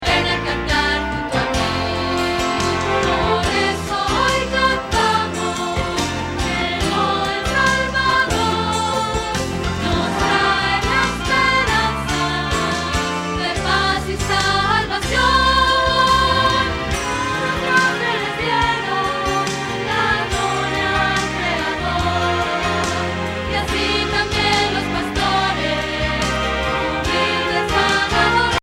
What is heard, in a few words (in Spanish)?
Contralto